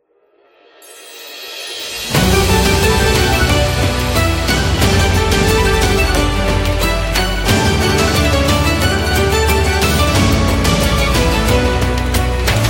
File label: Arabian